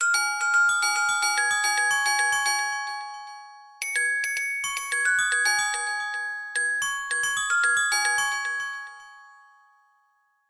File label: Vivo X70 Official Ringtone